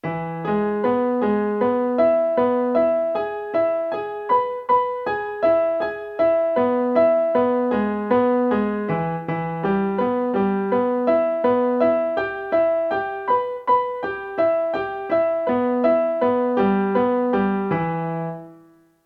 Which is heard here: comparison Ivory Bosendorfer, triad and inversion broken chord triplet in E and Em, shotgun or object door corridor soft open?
triad and inversion broken chord triplet in E and Em